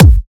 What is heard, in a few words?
good steel kick drum sample c key